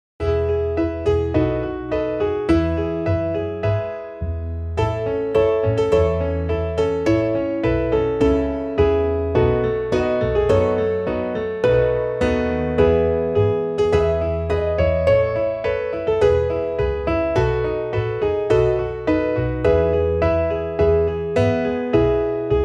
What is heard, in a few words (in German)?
midi, klavier; von vielen verdammt